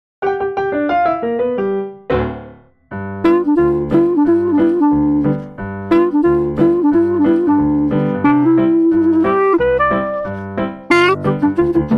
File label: Happy Clarinet Ragtime